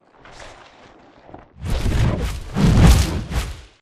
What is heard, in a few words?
flyer perched takeoff close; boneyard; Titanfall 2